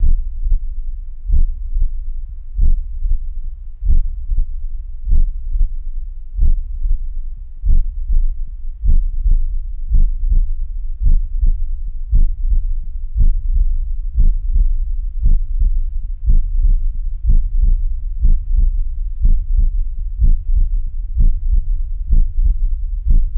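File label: Ambience Eerie Heartbeat Loop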